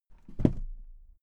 suitcase close case soft

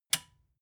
metal button release power off

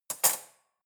cutlery fork drawer handle place

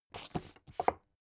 book shelve

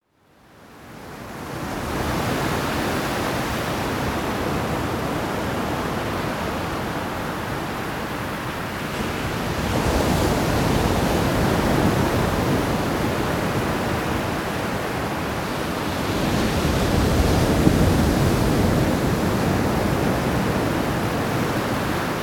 sea flood

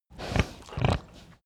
pig grunt breath

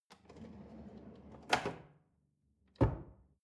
drawer large trash close lock

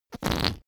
whoopee cushion fart low

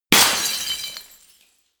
glass smash

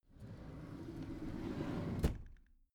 packed drawer smooth open soft

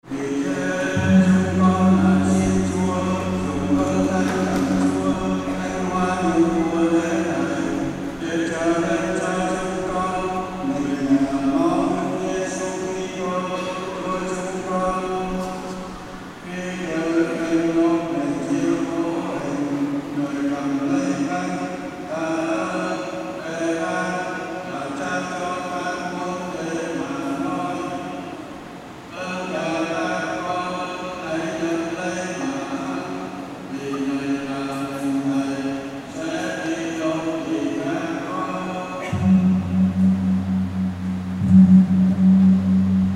church praying chant vietnam with gong

